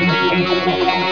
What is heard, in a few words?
sitar